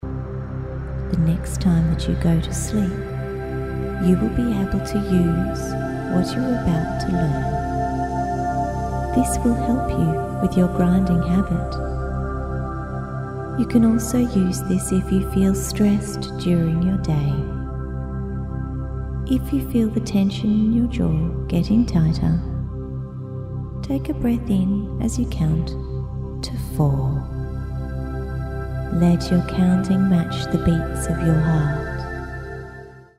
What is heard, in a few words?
Teeth Grinding